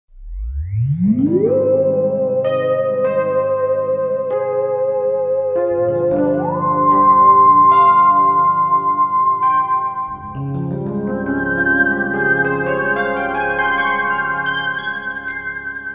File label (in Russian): termenvoks